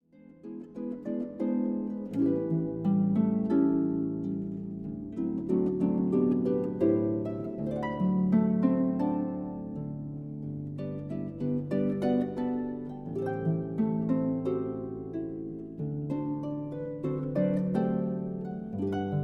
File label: harp; Verdalle; En Rêve